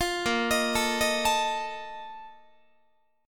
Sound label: A sharp Major 7th Suspended 4th, Guitar; A#M7sus4; arpeggiated; 20,18,18,19,19,20